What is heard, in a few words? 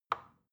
plastic button tiny release soft